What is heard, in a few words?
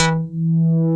PPG 2.3; DIGITAL1 HYB